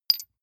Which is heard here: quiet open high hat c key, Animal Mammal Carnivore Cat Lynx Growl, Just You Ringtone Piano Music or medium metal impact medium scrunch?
medium metal impact medium scrunch